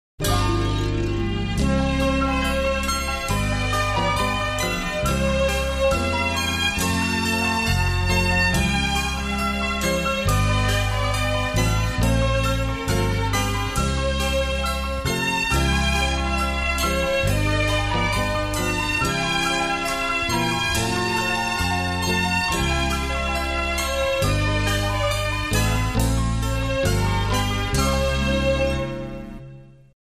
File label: Auld Lang Syne, Small Orchestra